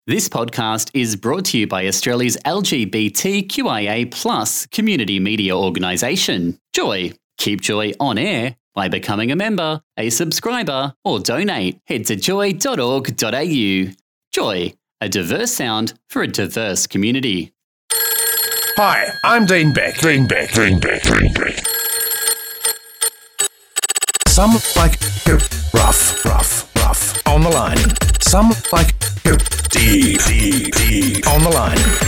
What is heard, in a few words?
Phone Tone